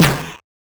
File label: snare; goodtakimu drumkit; fl studio; drumkits